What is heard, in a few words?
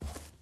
footstep grass